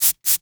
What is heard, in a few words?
Hydraulics; Motors & Switches